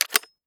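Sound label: g3sg1 slide forward, gun; arccw go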